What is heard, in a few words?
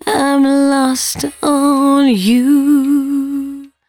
113bpm C#, Velvet Vocal; Zero-G